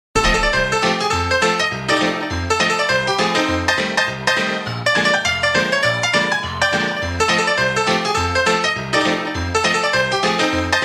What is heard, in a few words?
Wild west piano